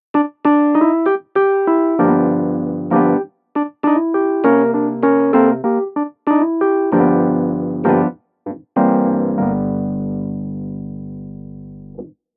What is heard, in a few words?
E Pianos; PC3